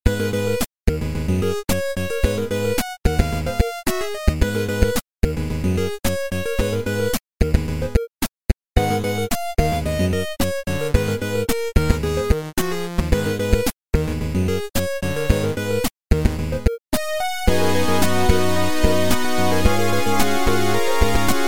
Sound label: 8bit